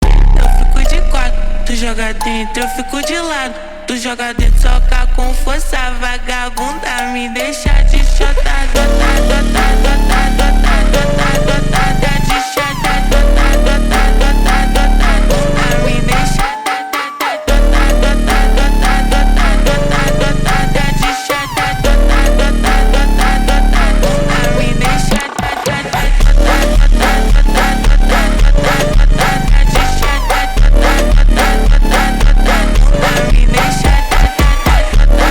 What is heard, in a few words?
funk do kalimba slowed